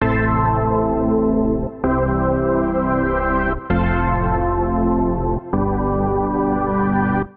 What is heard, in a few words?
Tape Organ (Am) 130bpm; Music Loops; Ableton; Factory Packs; Singularities